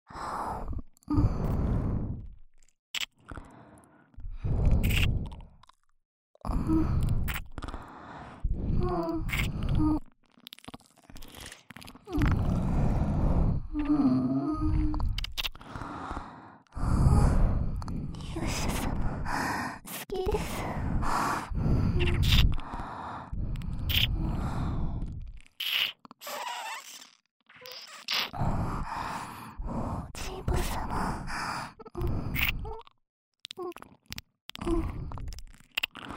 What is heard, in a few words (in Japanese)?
シンクロ囁き耳舐め; エミィ